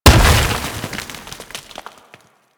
Scan Pillar Plant Debris; Warframe; Frumentarius; Scan Ability

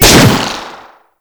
Raptor Fire 3D, Weapon Fire; Warframe

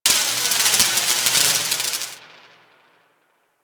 Electrical Spark; Ambience; Props; Warframe; Orokin

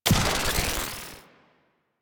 Ammo Efficiency Ability Hit; Warframe; Helminth Abilities

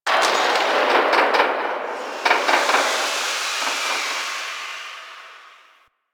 Venus Landscape Construction Site One Off; Ambience; Warframe; Venus Open World

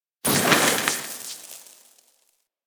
Spark Shower; ambience; props; one offs; Fortuna; Warframe; Venus Open World; Venus Solaris United

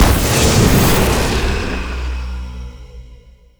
Torch Burst; Uriel; Warframe; Tau; Circles Of Hell

